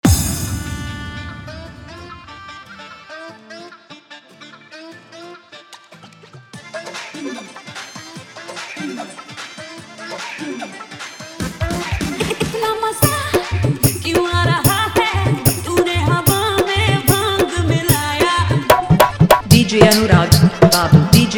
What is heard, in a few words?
Holi Full Hard Dhollki Desi Dance Mix